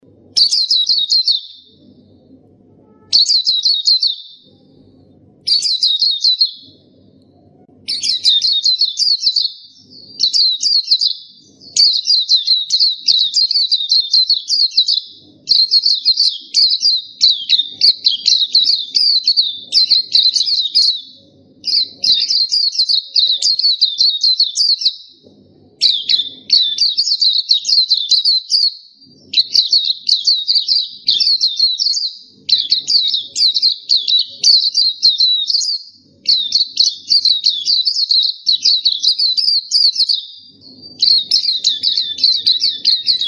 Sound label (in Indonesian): Suara Burung Pleci Gacor Ngerol Panjang